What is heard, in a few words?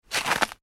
human footstep ice; Single Footstep On Ice; Footsteps; McKinney Sound